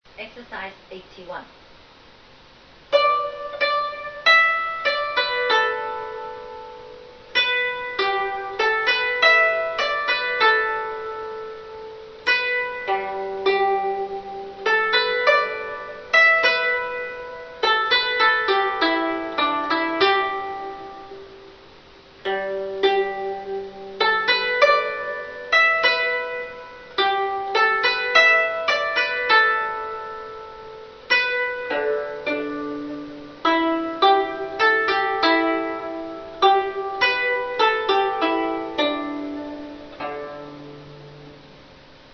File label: zheng